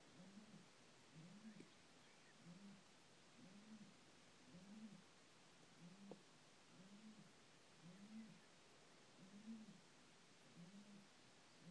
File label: bird cooing or old man moaning